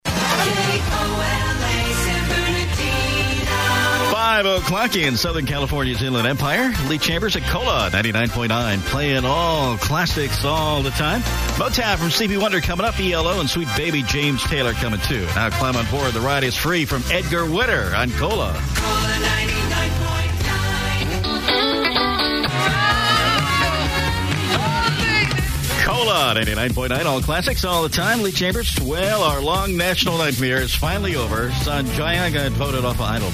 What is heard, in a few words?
classic hits